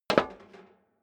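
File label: step dress boots metal rattle single stair down, footsteps; schritte; menschen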